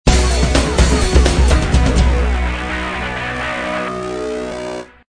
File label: swamp - success